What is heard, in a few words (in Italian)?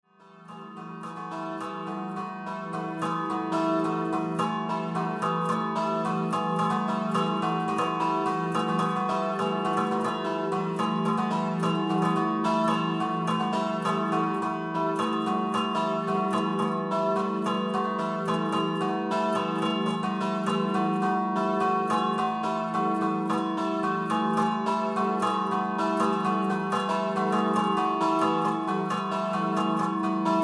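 Risano Scampanottata, campane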